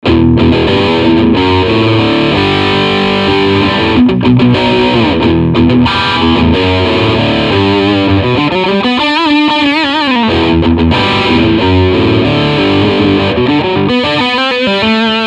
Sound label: Crunch Riff